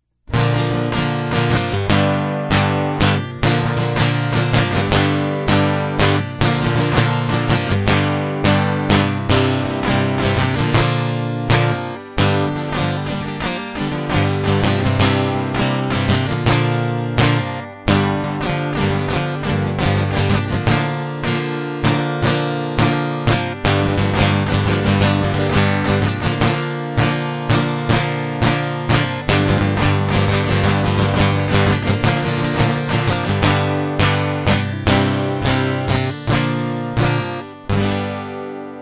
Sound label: Guitar